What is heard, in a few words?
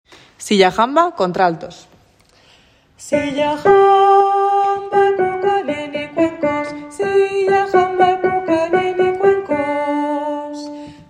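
alto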